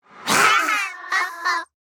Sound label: Hunter Grunt Hit; Realm Royale